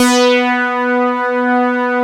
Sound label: OB FILTER B3; E-MU Formula 4000 Series Vol. 3; Analog Odyssey